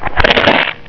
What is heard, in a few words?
crunch; swbreakthrough